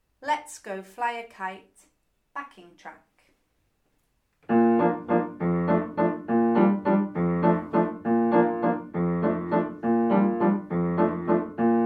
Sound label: Backing Track